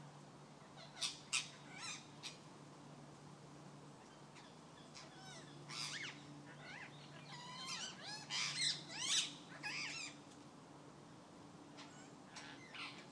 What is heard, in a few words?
Severndroog Castle rose ringed parakeets